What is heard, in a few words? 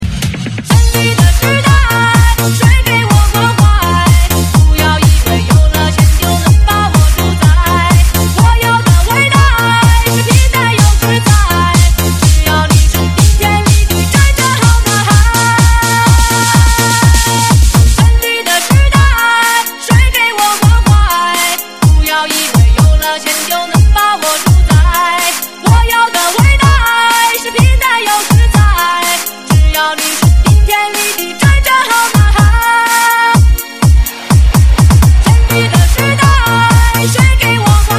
dj